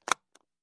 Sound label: Case Click Lock